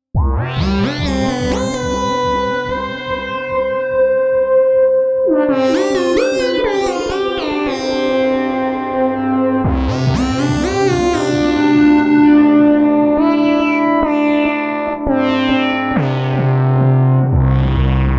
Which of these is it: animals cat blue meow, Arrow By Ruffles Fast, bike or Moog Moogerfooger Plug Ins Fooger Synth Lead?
Moog Moogerfooger Plug Ins Fooger Synth Lead